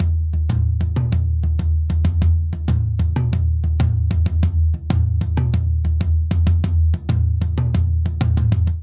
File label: Simple Seven Toms Only 7/8 190 bpm; Ableton; Factory Packs; Drum Booth